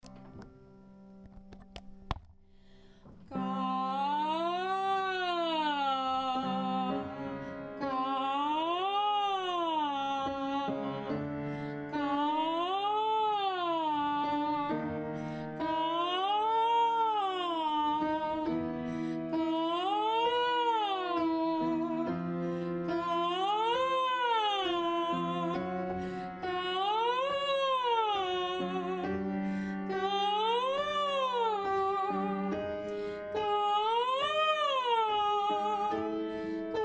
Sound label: Gah ascending head, Voice Practice